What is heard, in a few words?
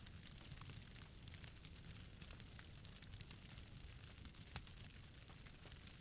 fire pillars loop